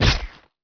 gen hit; Revista CD Expert 45; ZEUS

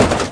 shock; tdordemo

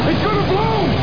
blow

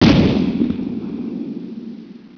Lg Steel Ball; The War Engine

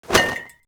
fire throw

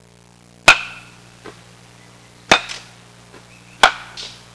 HITTING, SPORTS; Easy Personal Web Page

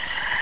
cricket

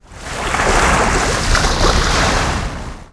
wtr flow; Comanche

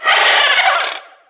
eel hit; DAoC

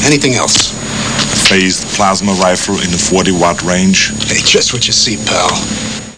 PLASMA

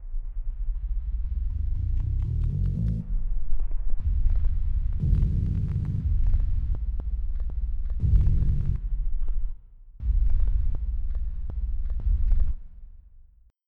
Mood; Onj's Soundfont

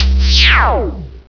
open; Net Power 2000 #2

